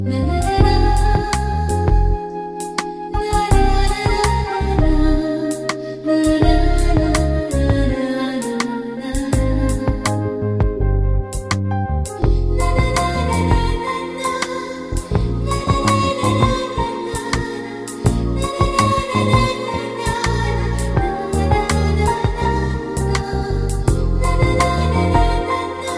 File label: perazhagan romantic